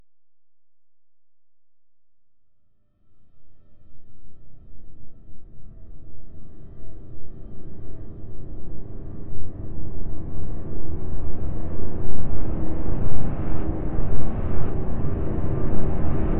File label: crash noise research